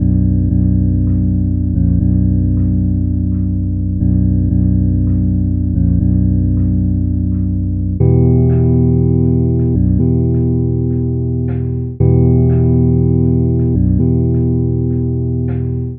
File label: Bass Bellstrum; Sonic Foundry (Sony Creative Software); Club Joints and Street Anthems